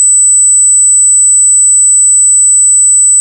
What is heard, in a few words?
high pitch